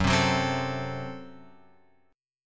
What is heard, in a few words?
F sharp Diminished; F#dim Chord {2 3 4 5 X 5} strummed